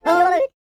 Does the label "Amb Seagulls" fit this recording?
No